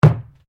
Sport Ball Rubber Bounce Wood Hollow Interior, Rubber ball